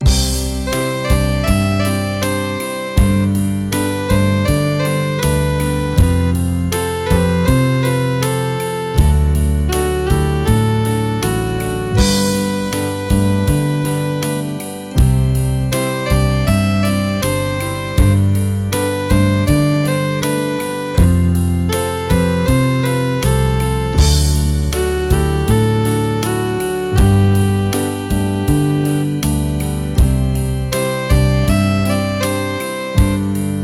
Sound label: Saxophone